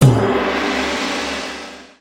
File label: Shengxiao Wild Move